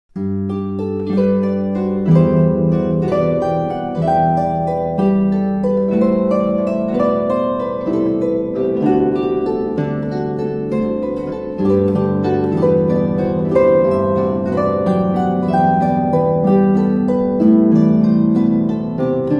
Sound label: jesu joy of mans desiring, christmas carols on the harp